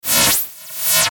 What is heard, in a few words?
Sweeper High Static Pulse; Production Element Imaging Element Accent Transition; Sweeper Sound Effects